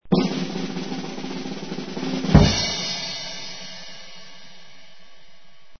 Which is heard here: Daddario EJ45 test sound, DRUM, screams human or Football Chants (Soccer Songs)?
DRUM